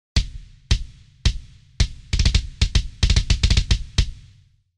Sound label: kicks, metal kit; frostbite orckings; klangmacht